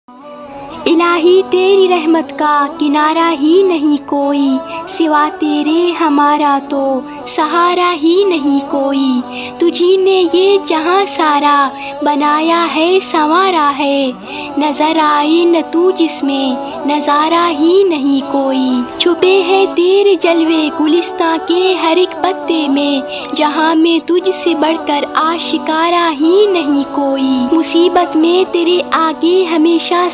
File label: arabic ringtone islamic ringtone turkish ringtones bgm ringtones